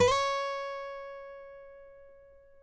C#5, FNGSTYLE, Guitars; Spectrasonics; Hans Zimmer Guitars VOL-2; FNGSTYLE PFM

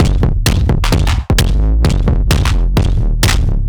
Beat Valve 130, distorted drum loops 130bpm; musicradar

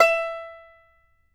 banjo E5, banjo notes, country; Sampleheads - Whole Lotta Country 1 & 2

